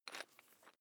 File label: electric drill bit fasten wrench turn fast